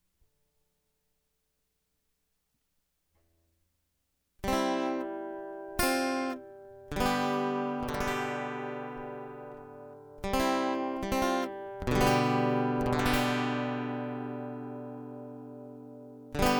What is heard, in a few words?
Guitar Interlude